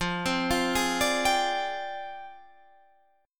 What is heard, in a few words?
F Minor 6th Add 9th, chord